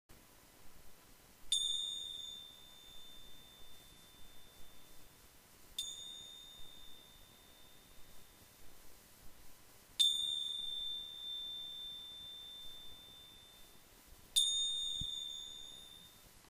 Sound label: CROTALETES music